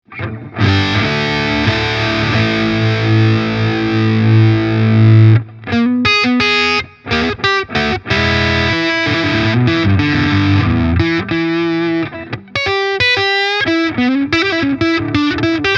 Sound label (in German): Verzerrt Hals erst HB dann SC